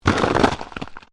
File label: Ice Crackling Low Short; Ice movement, cracking and shifting; Snow; Weather